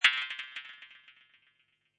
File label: Reso Mouseover, Echoey, resonant, narrow band space tick; good for website or menu system etc.; Lynne Music